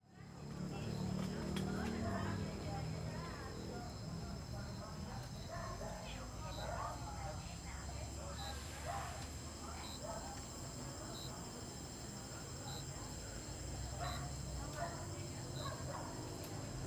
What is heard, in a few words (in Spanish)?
AMB EXT TARDE CIUDAD Corrientes ARG Transito grillos